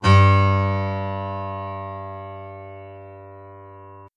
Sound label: g2 adsyn